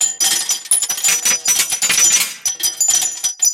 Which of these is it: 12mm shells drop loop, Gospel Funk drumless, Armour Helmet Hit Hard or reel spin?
12mm shells drop loop